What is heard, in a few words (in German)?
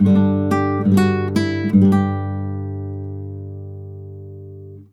guitarre klassisch, instrumente